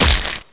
flesh floor, zombie

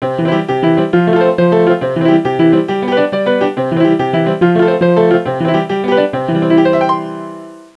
piano

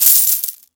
cash pickup

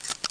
m870 reload

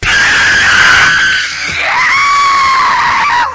eerie freaky scream

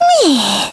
alert; teddy

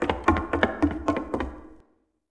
wood run by

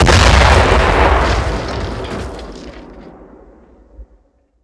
mortar hit

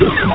door

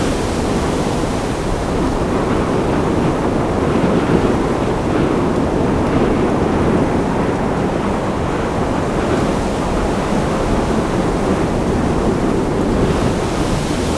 roof wind

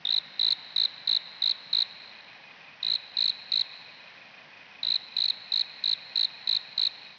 cricket loop, forest trail ambient

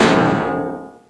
pipe hit, weapons

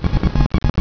mecha

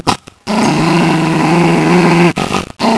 grrr

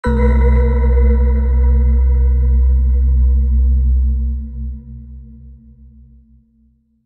Alien Com

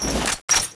mk46, weapons sight

